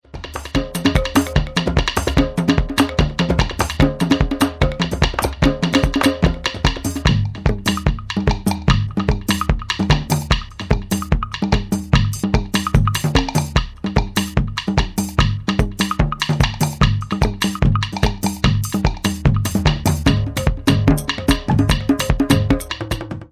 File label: mid drum jazz; Dance CD; Modern